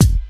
rap steel kick drum F sharp key, Royality free steel kick drum sample tuned to the F# note; kick drum samples